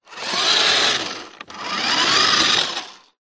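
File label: leisure radio controlled car off push carpet short fast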